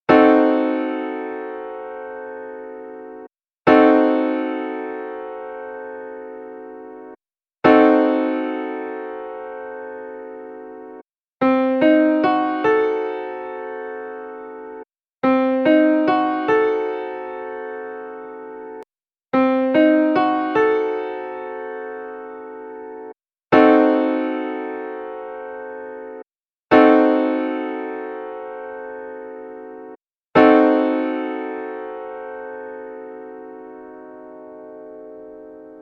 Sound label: Cdim Piano Chord